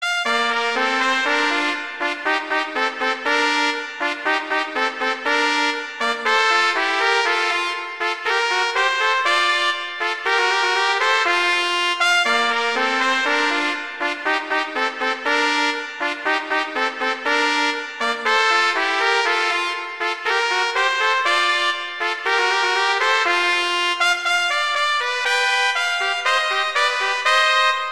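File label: Duet, horn; Mozart; Duets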